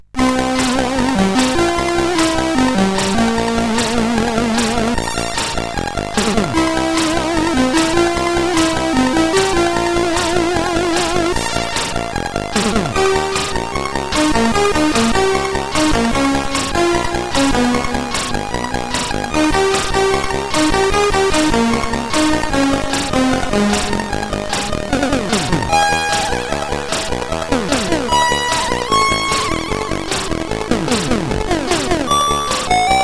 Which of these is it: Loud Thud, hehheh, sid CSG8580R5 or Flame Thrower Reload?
sid CSG8580R5